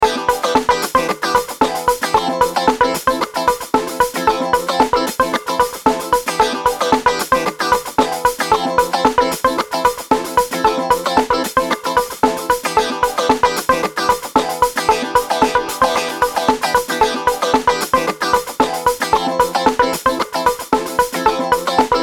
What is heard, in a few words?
Dreamy groove